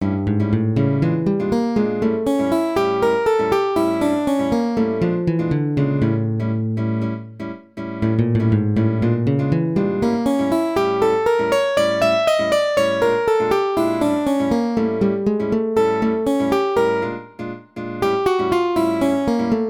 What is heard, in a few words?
A7 arpeggios in minor key; gipsy jazz